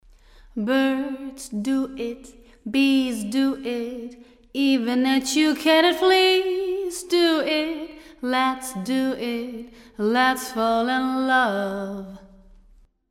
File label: Vocal Big Studio